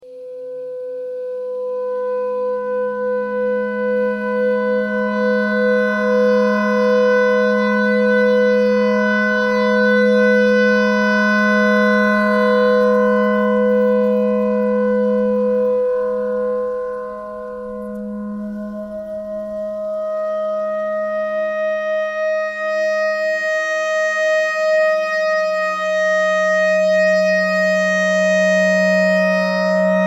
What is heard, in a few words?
clarinet clarinet notes e noise